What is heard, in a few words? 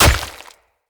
Dagger Impact Flesh; Weapon Impacts; Daggers; Dendrit Dagger; Combat